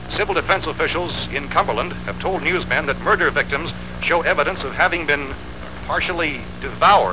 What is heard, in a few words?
devoured